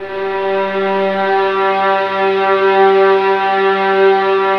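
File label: violins A3, Synclavier Strings; ILIO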